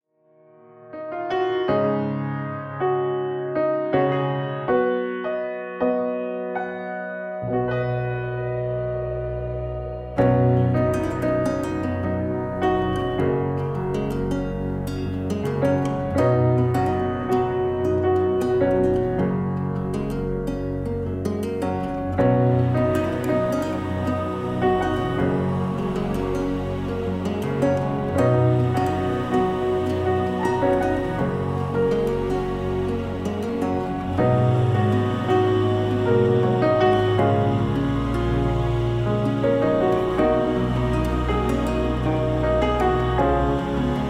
Relaxing

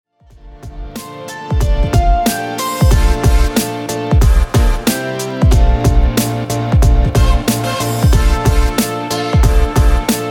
New York Boom Bap

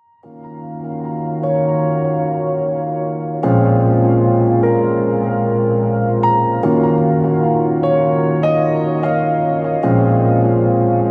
Piano Chill Out Groove

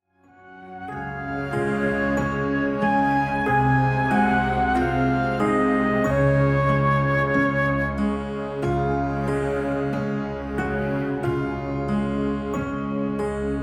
Baby Lullaby

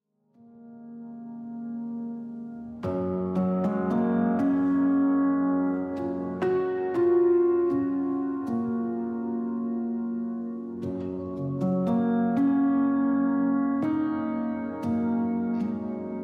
Deep Relaxing

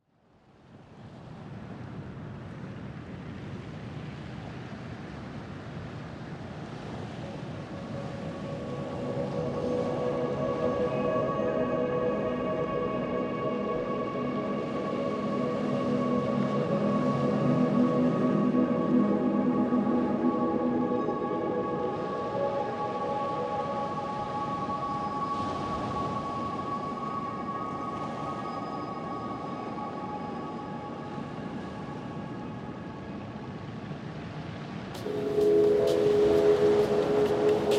Deep Sleep Relax Music

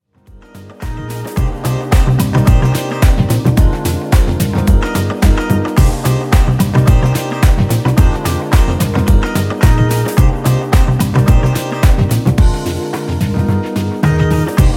Uplifting & Funky Lounge Groove

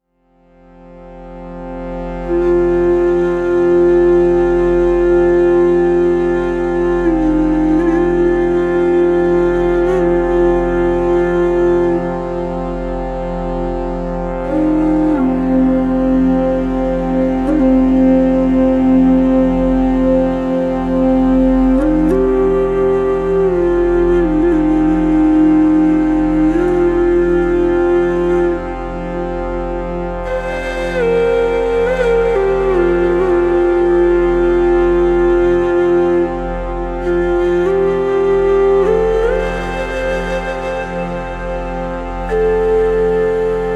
Shruti Box Native Flute

